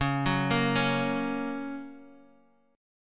Gb Major Db

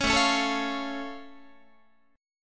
A Augmented A down Guitar; strummed; 16,17,16,19,17,16; JGuitar

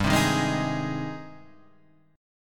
Ab Major, G, guitar chord; strummed; Ab/G; JGuitar

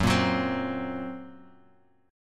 Gb Major Flat 5th, down, Guitar, Open F; strummed; JGuitar

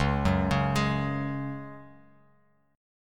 Db Suspended 4th, Bass Standard; 9,9,11,13; arpeggiated

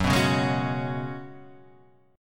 Gb Suspended 2nd Suspended 4th; Gbsus2sus4 strummed; x,x,4,4,1,3,3,3

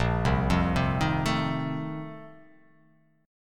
A sharp Major 7th Suspended 2nd Suspended 4th

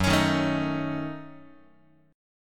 Gb Minor 6th, Guitar; strummed; JGuitar

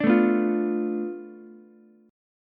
B Minor 7th Flat 5th B Ukulele; Bm7b5; strummed; 7,9,7,8; JGuitar

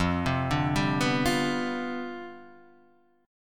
C Major 7th Suspended 2nd Suspended 4th F, chord

